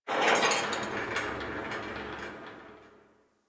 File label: Exterior Tractor Metal Groan; Ambience; Props